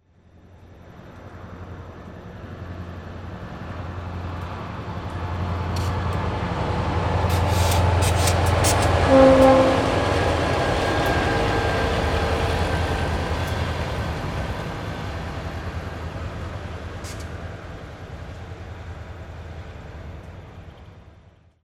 TRAINS

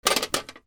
BATHROOM

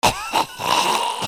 PEOPLE

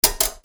CLICKS

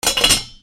KITCHEN

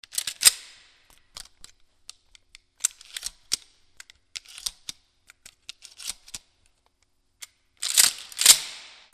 WEAPONS

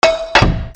FALLING

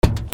CARS

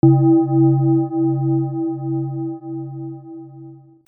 BELLS

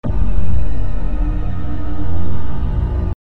MUSICAL

